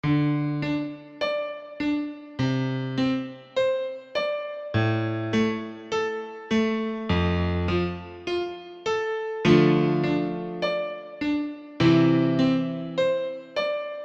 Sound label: piano attempt